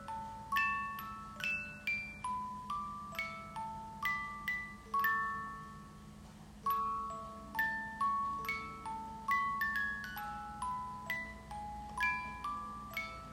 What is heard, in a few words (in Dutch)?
Muziek IJsbeer